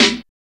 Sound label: DRUM BS SN; Drums In Your FACE!